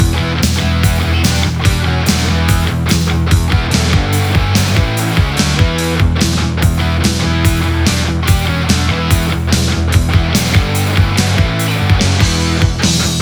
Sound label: Boss Ginormus Loop; Galaxy of Pen & Paper